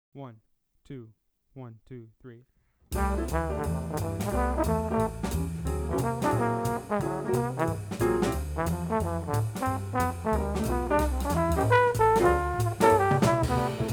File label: trombone